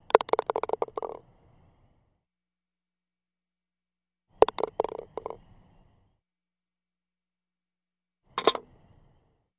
Golf Ball Cup X3

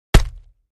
Body Punch

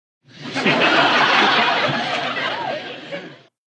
Crowd Light Laughter, medium

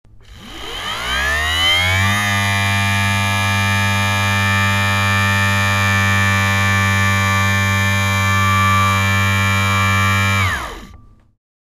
Drill On Run Steady, servos

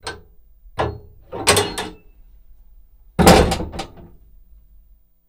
Hatch Armored Carri; hatches

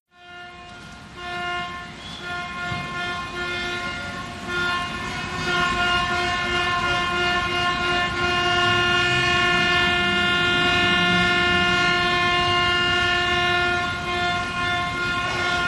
City Traffic Honking; big city

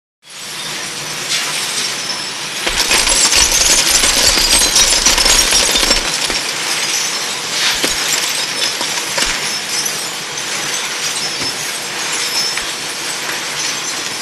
Washing Machine Scra, glass

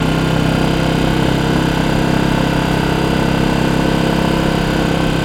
Yamaha Riva Motor Sco, scooters

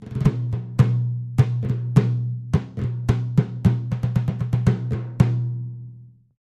Timpani Hands, mallets timpani

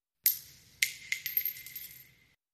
Shell Casings Single

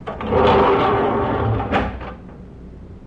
Elevator Door Open

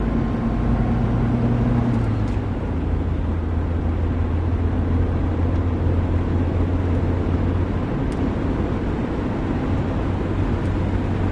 Jeep Chrke 84 Int Driv; jeep cherokee 84

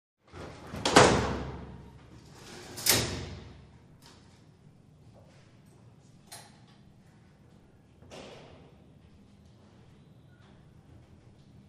Elevator Old style, elevators

